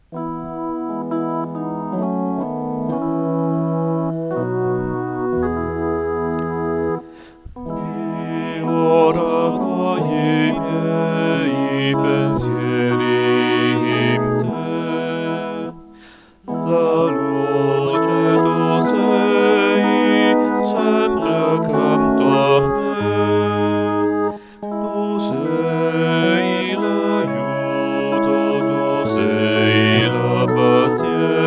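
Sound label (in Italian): Ma Tu Sai bass; Aber du weisst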